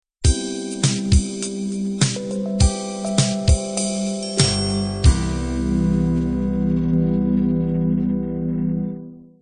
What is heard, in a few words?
color beat end